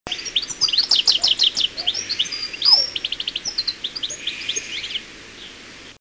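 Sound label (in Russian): chernogolovyy schegol golos, goldfinch voice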